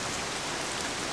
rain; Ambient; MX vs ATV Unleashed; XBOX

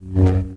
lightsaber swing fast

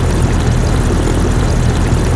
loop a, engine; Quantum Redshift; XBOX; kaitlyn

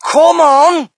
crowd, shout; Mike Tyson Heavyweight Boxing

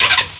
lift activate; Star Wars Clone Wars; XBOX; Xbox Live Effects

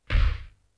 STEP; UFC Tapout; XBOX

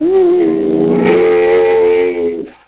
camel long; Ambient; MX vs ATV Unleashed

